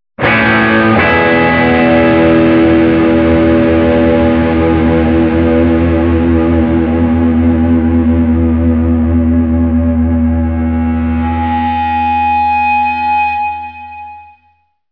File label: power chord, two power chords